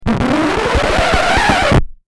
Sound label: Record scratch